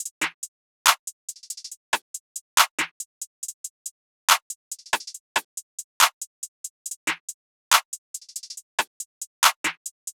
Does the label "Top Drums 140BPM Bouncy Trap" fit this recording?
Yes